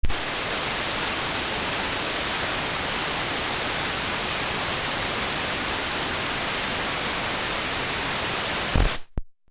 Quasar TV VHF Snow, Television switched on, no reception, snow and white noise; then switch off with a deep electric pulse; Noise Sound Effects; LynneMusic